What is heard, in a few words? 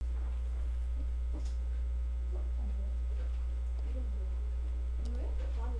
door